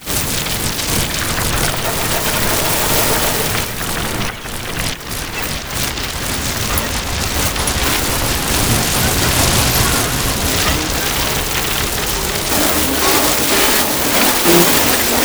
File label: GRIP, Disjointed Textures; USB Soundscan vol.33; AKAI; CHAOS